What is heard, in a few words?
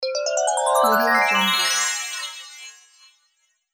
cartoon weird rising accent; cartoon sounds; صدای زنگ کارتونی